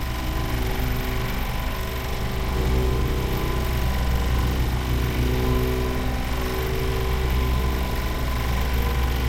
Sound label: Portal Motor; Game rip; MiSide